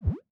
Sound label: Cartoon Wobble